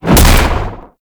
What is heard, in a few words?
Queens Chamber Shield Blast; Ambience; Warframe; The War Within